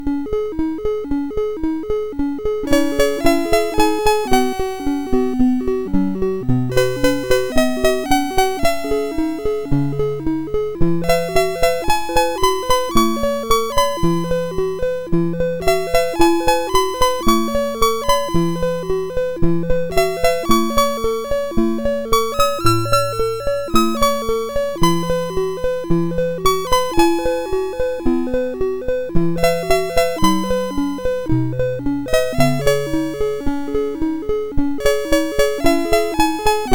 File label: sid, MOS6581R3